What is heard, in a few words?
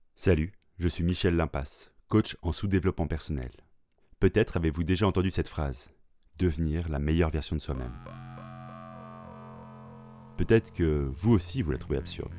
Jingle generique